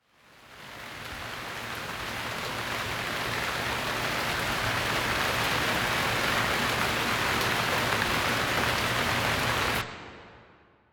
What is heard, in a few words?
Rain Loop; Yu-Gi-Oh! Master Duel